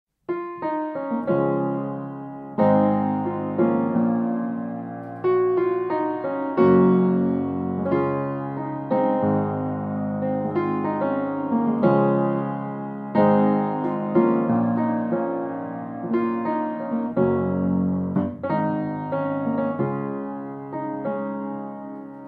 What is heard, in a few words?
Piano